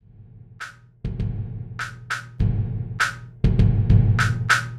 Snap Drum Groove; Electronica; Composite Beats

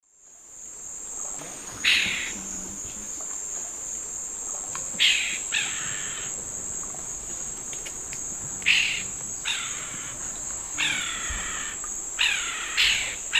Donacobius atricapilla, calls; S. America; Eastern Lowlands

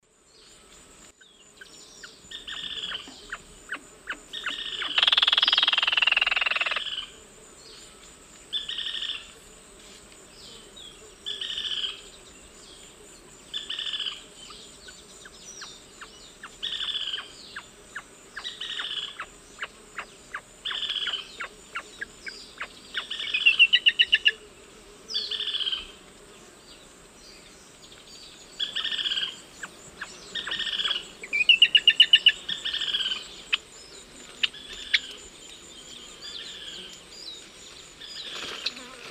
Laterallus exilis, song, calls, flight calls; S. America; Eastern Lowlands

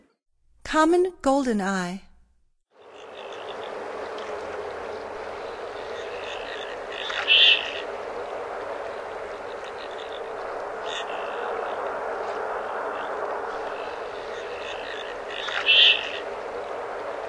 Common Goldeneye; North America; Stokes

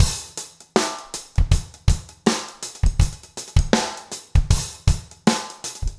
trip hop 80

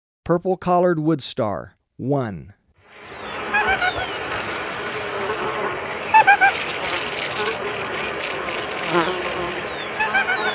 Purple-Collared Woodstar, Birds of Ecuador; S. America

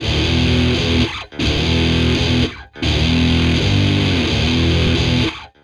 Metal Chunk Guitar; Guitars

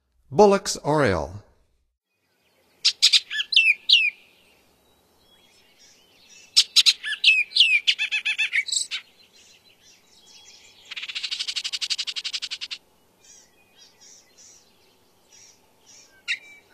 Bullocks Oriole, Songs of Birds of North America; Stokes

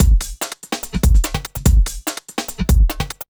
kika 145

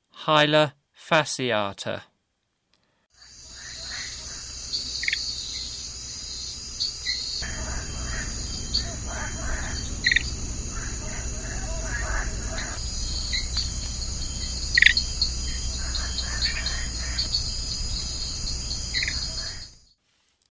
Hyla fasciata, Frogs of Ecuadorian Amazon; S. America

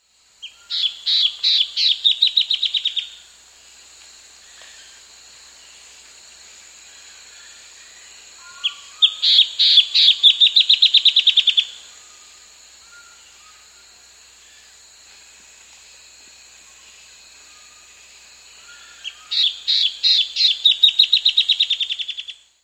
Striated Antbird, S. America

